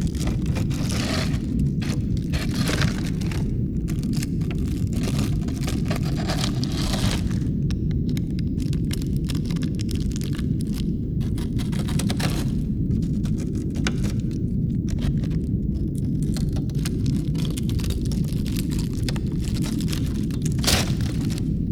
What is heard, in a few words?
crystal ship idle; Stellaris; other entities